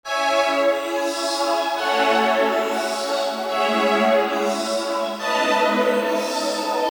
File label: Strings, Melody Loops; TRUTH-HZ; Crowdsourced Sample Pack